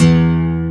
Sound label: ACOGTR; AKAI S6000 CD-ROM - Volume 1; AC GUITARS